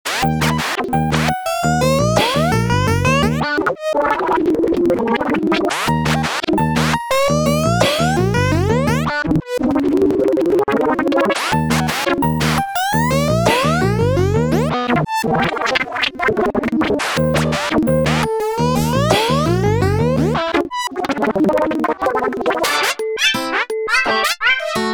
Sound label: deconstructed falling block aerobics puzzle type beat; weeklybeats 2026